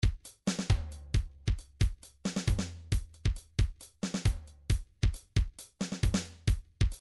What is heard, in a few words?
trance midi loops beats